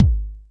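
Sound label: bass drum, drum machines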